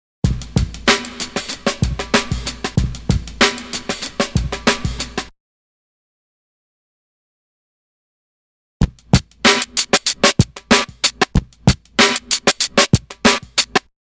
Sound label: Drum loop; BEST OF DRUM BREAKS 30